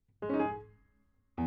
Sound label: Piano